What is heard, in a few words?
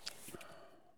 drink can put away; Escape from Tarkov